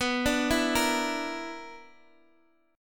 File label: B-Minor Major 7th Double Flat 5th, Guitar Open D